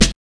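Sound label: snare drum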